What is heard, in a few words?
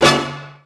Stab C1; Mo' Phatt; Digital Sound Factory